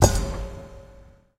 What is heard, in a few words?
scatter Hit; parthenon